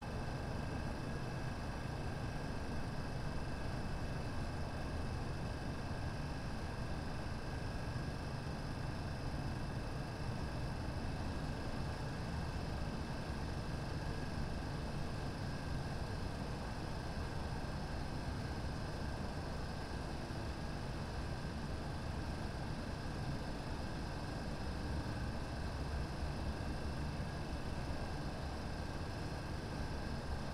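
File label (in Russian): звук электрического жужжания на фоне шума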